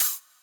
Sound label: steam Short; eggomatic